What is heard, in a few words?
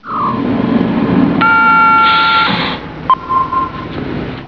knight rider button